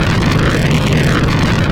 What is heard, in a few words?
tag rocket fly, weapons